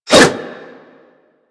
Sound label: Dagger Heavy Whoosh